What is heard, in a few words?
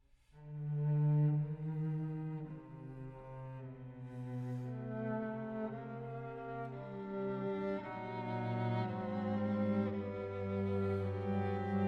Str Qt opening; Shosti